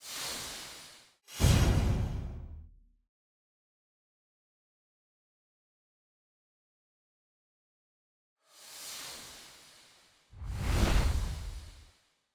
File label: SFX; VersesAndFlow; Smollette